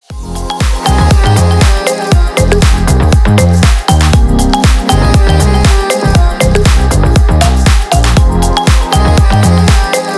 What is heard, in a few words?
funk